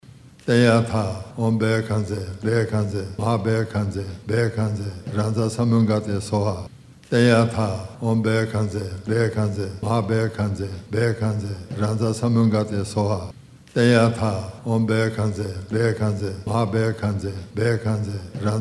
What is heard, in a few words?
Medicine Buddha Mantra